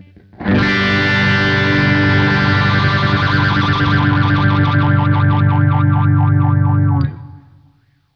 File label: Spaceman Artemis RES